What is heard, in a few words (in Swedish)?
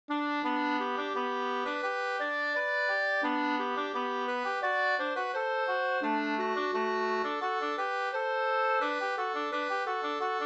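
snoa, two-part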